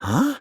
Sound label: huh